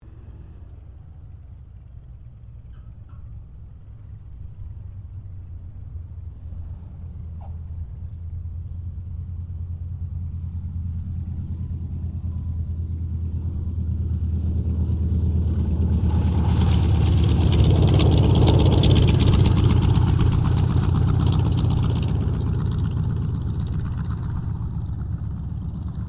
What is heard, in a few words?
AIRCRAFT T-28D TROJAN LAND; Airplanes; Vehicles